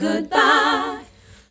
Goodbye Group D, Vox One Shots

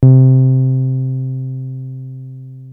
303 C3, TB 303, Synthesizer; Hollywood Edge; Giorgio Moroder Rare Synthesizer Collection

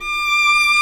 Vla D5; Digital Sound Factory Virtuoso

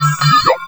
VFX, VOX ROBOT

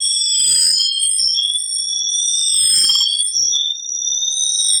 Signal, Textures

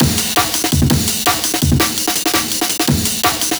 167 Break, breaks; M8

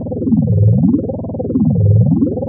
Noise, LFO & Noise

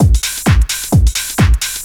dr t 130 trance 2

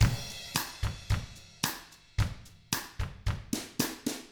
COUNTRY, Drums; ILIO; Double Platinum Drums 1

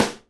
snare GLASS, Live Snares

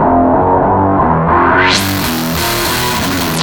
Treat Guit, Industrial; Nasty

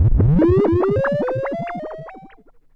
Perc Synth Moog Delay

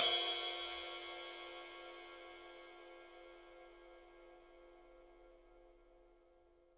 ride cymbal; 3D Sound; 3D Drums

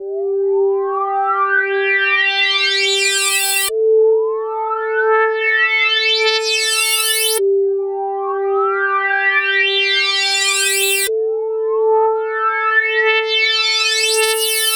NRG Sweep, Trance

